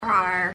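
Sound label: drawer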